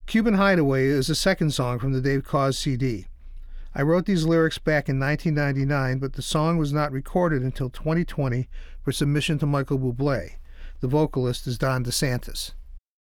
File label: Cuban intro